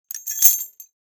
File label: Light chains handling